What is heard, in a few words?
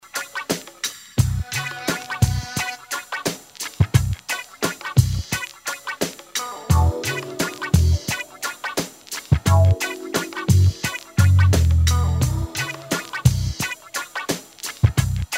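Sitar Guitar; SitarGtr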